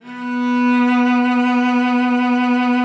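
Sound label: B3, cello vibrato; Xsample VOL-7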